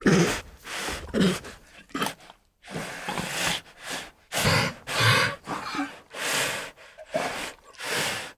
Siberian Tiger Makes Hard Huffs, Puffs, Sniffs and Snorts; Tigers; Sound Ideas